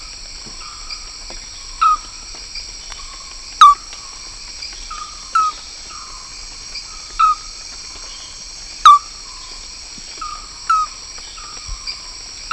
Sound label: Synap mirandaribeiroi